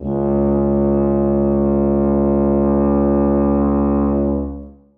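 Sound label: tuba sustain c#2; Sonatina Symphonic Orchestra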